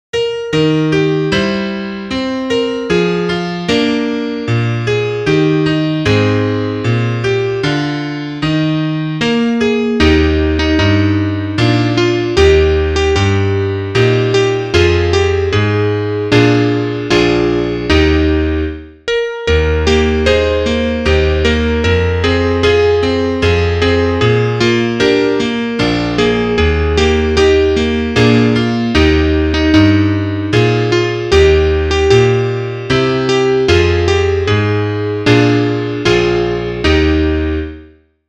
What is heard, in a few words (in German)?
Klavier; o tannenbaum